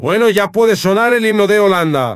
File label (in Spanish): himno holanda; tablegp